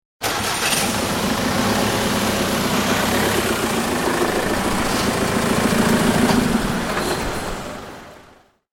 Taxi Starting, Traditional English black cab taxi starting up and moving off.; Traffic and Cars